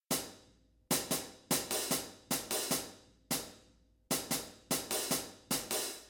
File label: drums charley; brut